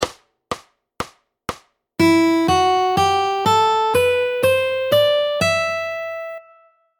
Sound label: Fingerstyle Easy Classical